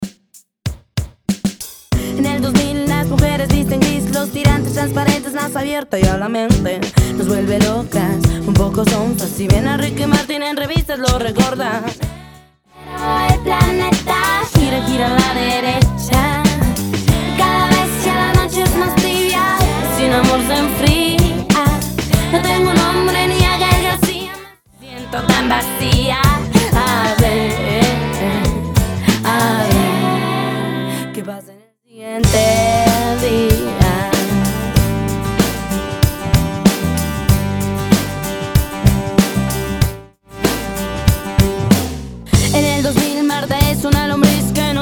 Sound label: 95 bpm